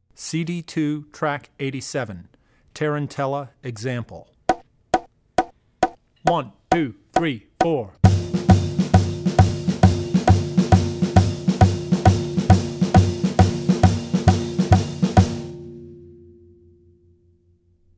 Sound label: Wedding Dances - Tarantella; CD2 Track87 Wedding Dance - Tarantella; Drummer's Bible Tracks